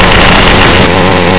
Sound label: chainsaw cut into flesh